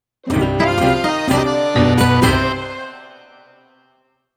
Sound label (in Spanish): AUDIOLOGO; EXPERTOS EN MARCA